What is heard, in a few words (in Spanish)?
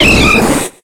Grito; Machop